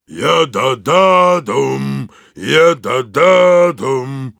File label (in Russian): Heavy singing, ru